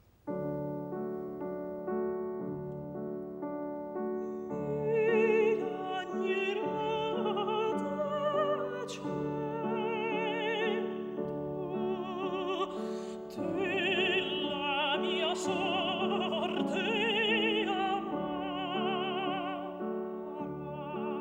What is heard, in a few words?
settings and album leaves for voice & piano, D major